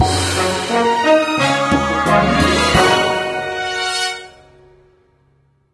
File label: Fx Win